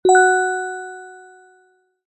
multimedia alert bell chime ping notification simple